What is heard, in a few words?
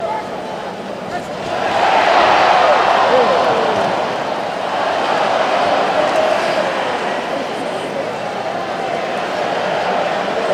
Crowd Cheers Ohhs